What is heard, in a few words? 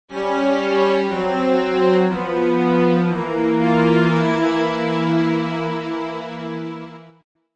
Death Strings; gamerip; the fancy pants adventures world 1